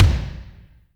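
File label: kick, drums; Drums In Your FACE!; FILM KIT1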